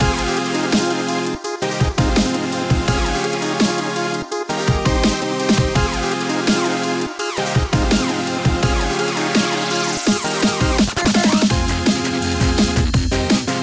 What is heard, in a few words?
Drumstep Menu; q 3ds gamerip 2015